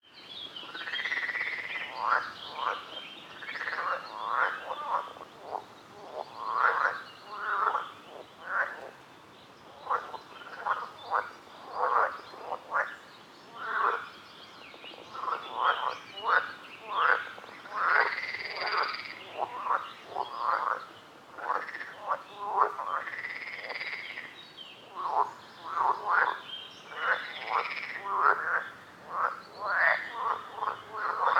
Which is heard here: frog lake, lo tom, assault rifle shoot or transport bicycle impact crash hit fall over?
frog lake